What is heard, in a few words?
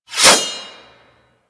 Tonfa Equip; Warframe; Tenno